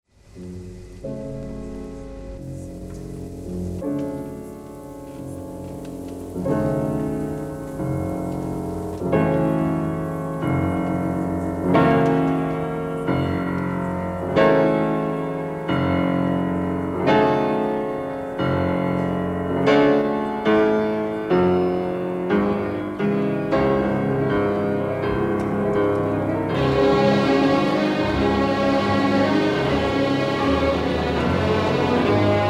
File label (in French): CONCERTO POUR PIANO ET ORCHESTRE EN UT MINEUR Allegro moderato; RACHMANINOV; op. 18